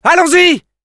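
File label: battle cry, French; Scout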